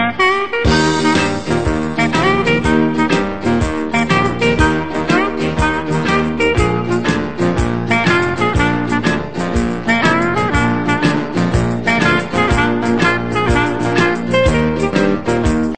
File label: OBOE RINGTONE